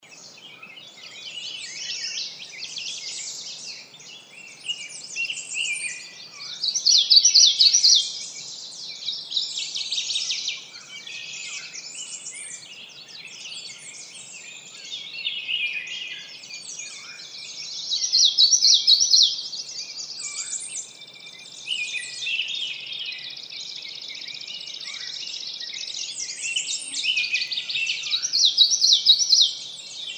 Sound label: manitoba forest